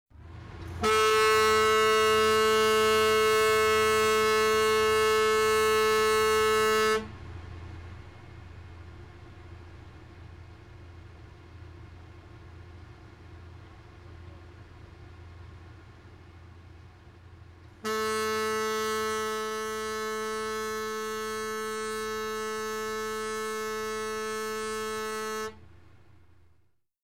bateau

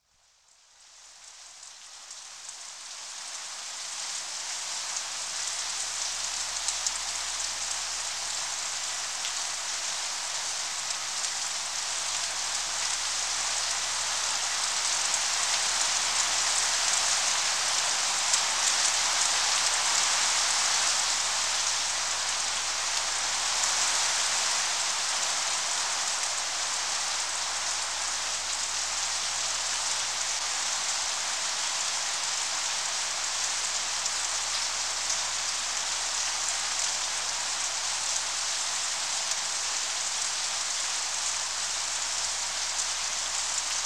pluie

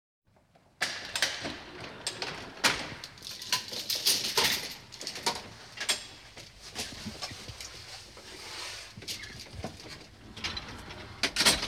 ascenseur

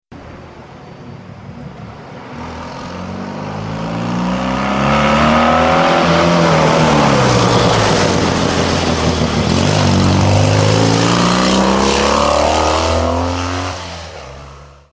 voiture

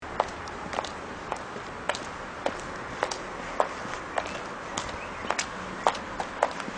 pas

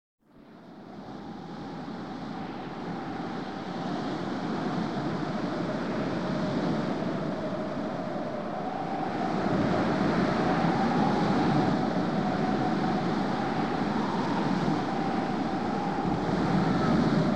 orage

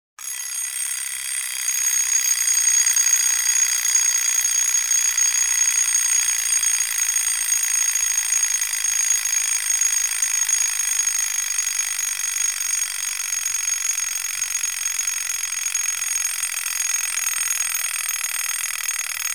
reveil

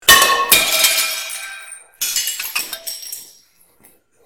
assiette

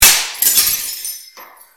assiette

